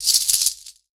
chain

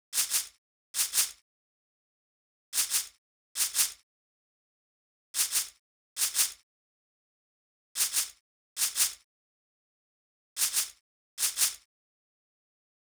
percussion

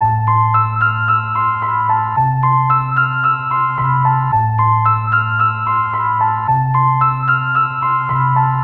Hardcore 111.3 Bpm

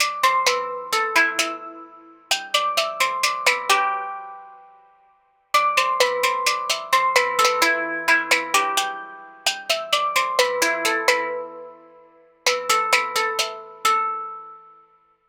synth loop 130 Em; zen

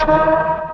Vox Ambient Hit

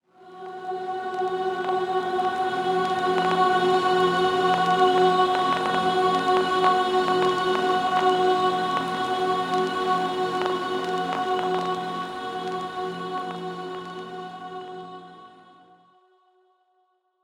Ethereal Ambience F; Cymatics

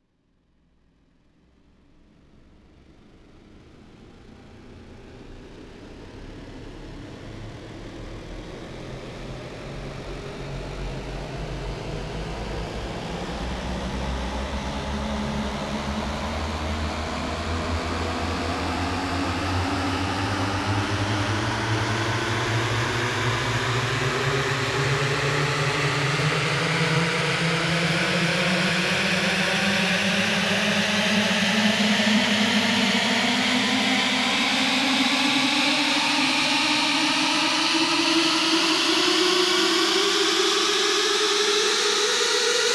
Sample Under Fx

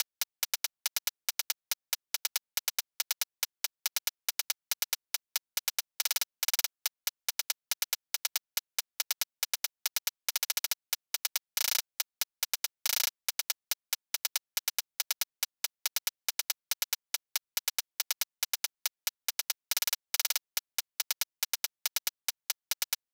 140 drum loop hihat

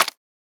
Perc

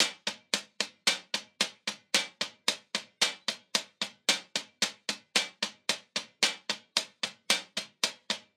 Hihat Loop Textured 112 BPM

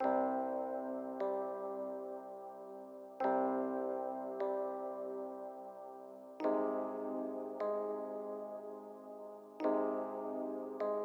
Pluck Cm 150bpm; SAVAGE MOOD vol 2

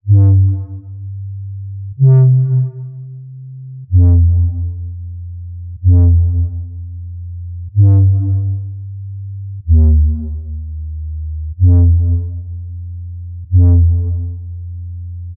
125 Fm Hollow Bass

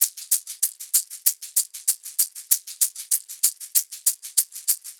Shaker Loop (96bpm); Async

